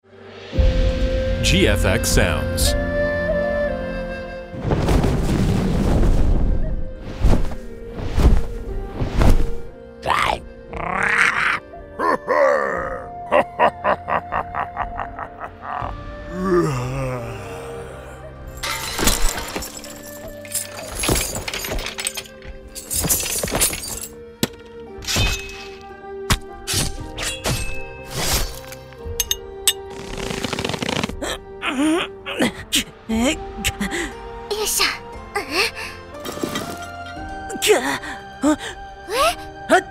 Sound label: fantasy game bundle sfx pack